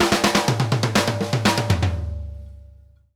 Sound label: Drumworks SP fills 120; Sampleheads; New York City Drumworks VOL-1